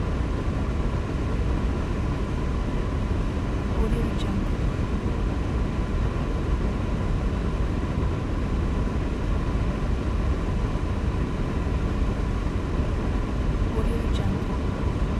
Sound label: large industrial air conditioning unit loop